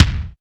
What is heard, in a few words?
BD, Bassdrums, Roland TD-20